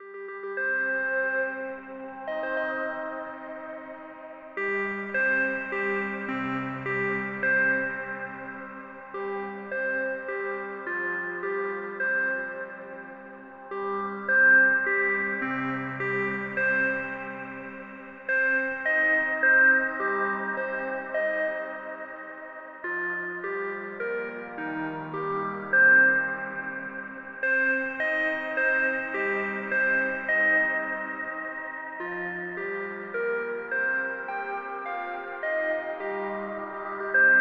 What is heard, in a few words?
March of the Bells